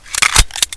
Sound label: clip in, weapons